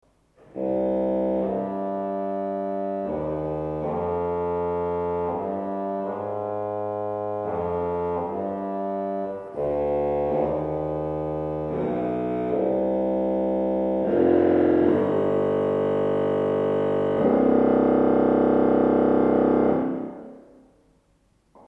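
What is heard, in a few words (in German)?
Posaune 16, Orgelstimmen; Orgelstimmen CD1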